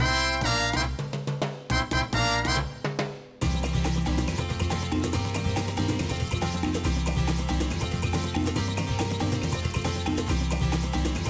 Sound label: Vs Win; Pbff Music#45 (Bgm Og Vs Win)